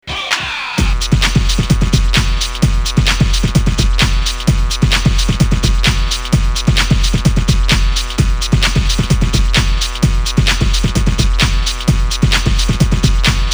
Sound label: beat